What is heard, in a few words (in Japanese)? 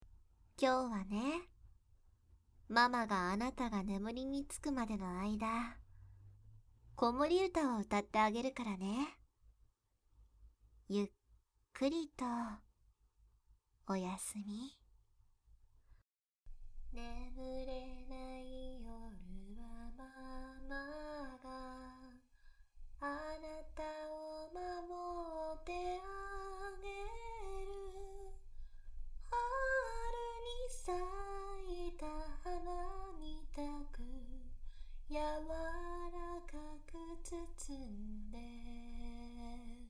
子守唄